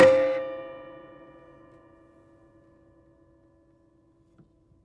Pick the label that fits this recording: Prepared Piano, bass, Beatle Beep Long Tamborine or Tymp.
Prepared Piano